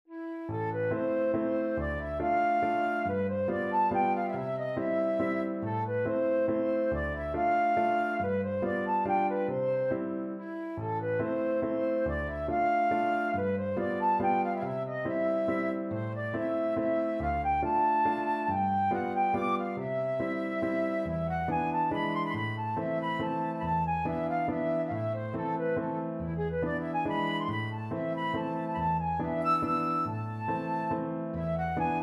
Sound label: waltz, flute